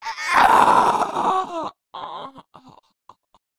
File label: Death Vocals; Witch